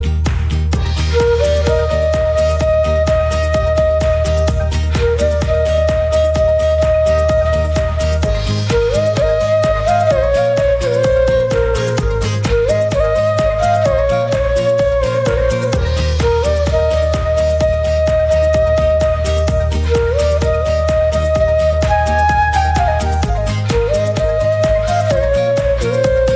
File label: flute instrumental